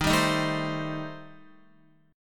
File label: D sharp 6th Flat 5th, D sharp, down, Guitar; strummed; JGuitar